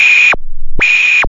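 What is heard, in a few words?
synth sequence; E-Lab; X Static Goldmine 1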